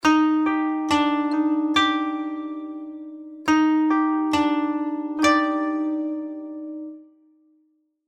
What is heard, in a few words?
koto